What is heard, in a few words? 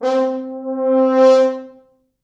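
French horns, four, c4; East West; Quantum Leap Horns Sax; 4 FrHorns fts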